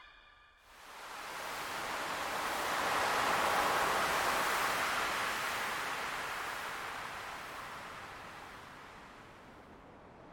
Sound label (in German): meer; Stardew Valley